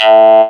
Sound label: Synths C; Vengeance Samples